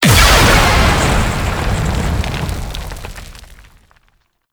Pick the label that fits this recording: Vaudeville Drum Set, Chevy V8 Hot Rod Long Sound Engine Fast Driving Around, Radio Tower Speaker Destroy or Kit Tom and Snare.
Radio Tower Speaker Destroy